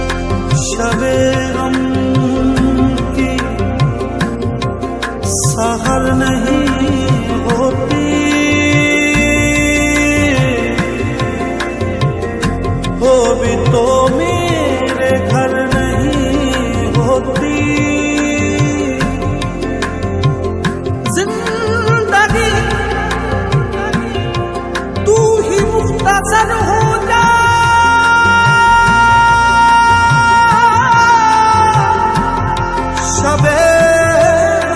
Sad Ringtone

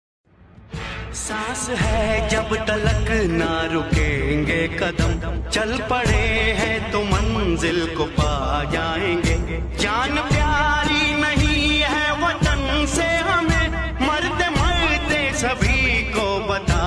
Happy Independence Day Song Ringtone

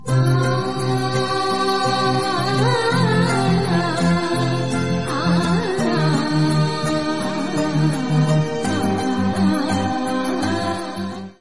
Morning Alarm Ringtones

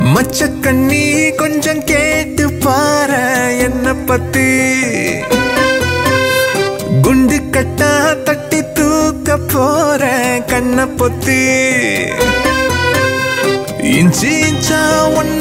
Tamil Song Ringtone; Seemaraja

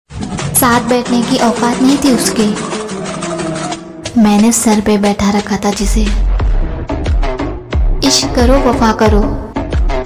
Attitude Ringtone

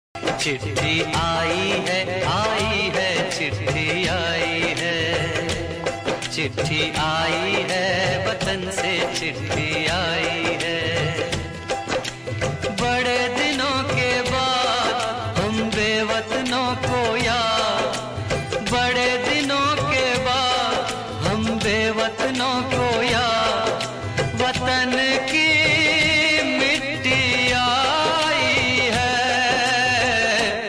Desh Bhakti Hindi Song Ringtone

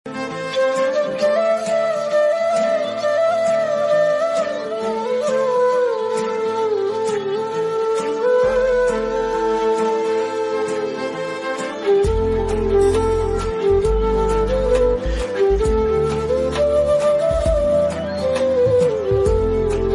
Instrumental Flute Ringtone

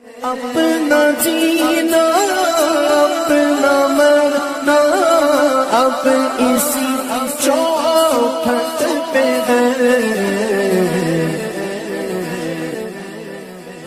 Naat Ringtone

